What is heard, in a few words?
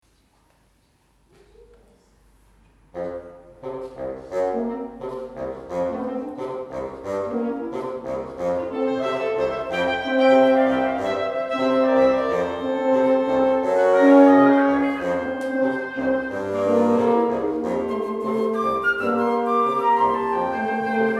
Rumba, wind